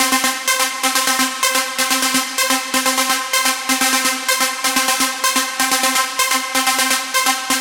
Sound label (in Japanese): Nexus 例 アルペジエイター